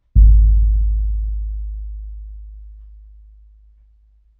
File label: Low Floortom With Sub